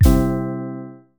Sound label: logo stinger